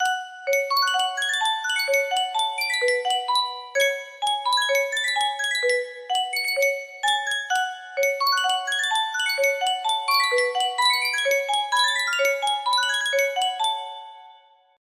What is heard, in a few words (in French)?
yunsheng boite a musique, music box melody